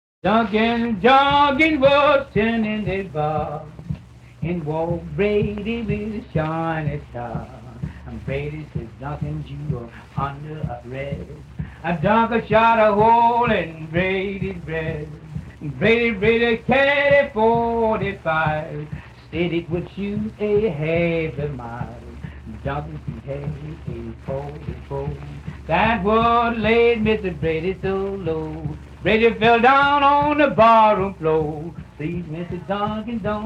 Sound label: Acapella